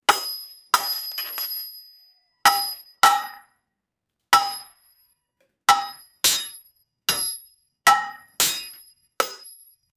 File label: different robot arms putt, robots